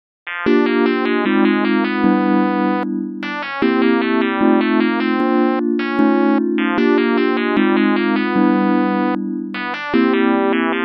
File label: Swing Example